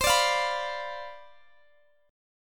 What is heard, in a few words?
C Major 7th Suspended 2nd Suspended 4th C down Guitar DADGAD